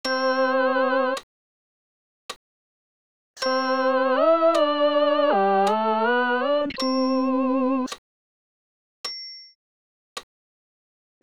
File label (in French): Sanctus tous voc